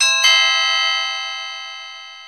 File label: notify doorbell; openmoko sound theme standard